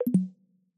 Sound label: Bottle